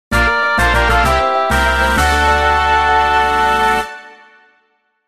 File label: jingle; maoudamashii; ahoge20